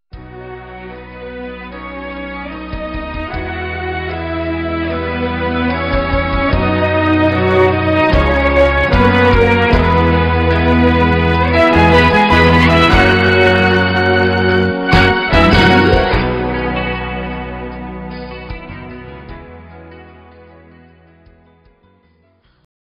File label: boot audio; Pyongyang 2407